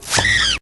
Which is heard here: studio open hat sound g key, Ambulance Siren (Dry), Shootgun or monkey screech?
monkey screech